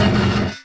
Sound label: Scrape, sfx; urbanchaos